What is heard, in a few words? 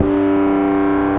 Dist Harmonic One Shot DG, Bass; Bass X